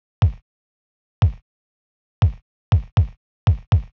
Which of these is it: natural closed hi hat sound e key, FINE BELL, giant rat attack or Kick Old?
Kick Old